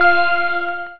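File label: antivirus shoot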